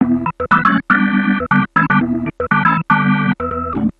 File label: Pirate Organ Riff